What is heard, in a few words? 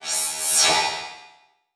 Title Transition Electrical Power Down; Adobe; Production Element